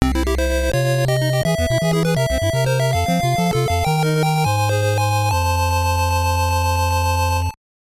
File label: Game Clear; Adventures of Lolo 3; NES